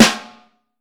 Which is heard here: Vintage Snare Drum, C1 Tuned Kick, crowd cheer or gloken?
Vintage Snare Drum